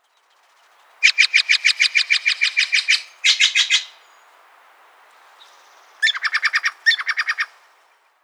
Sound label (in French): Geai de Steller cri